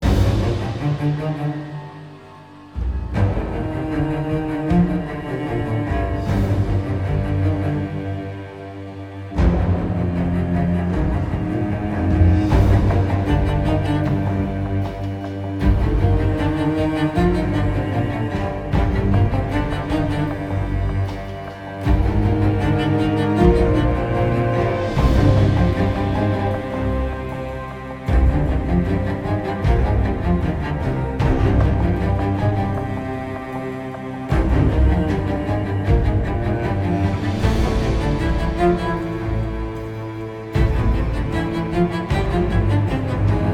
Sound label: Forest Combat; Dales; Intensity